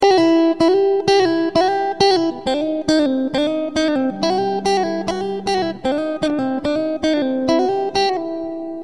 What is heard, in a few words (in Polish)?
podwojny hammer on i podwojny pull off